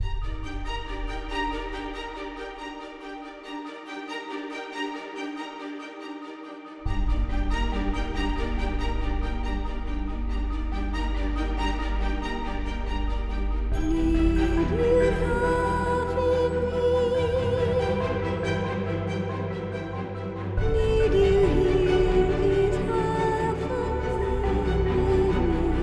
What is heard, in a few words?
(No Choir)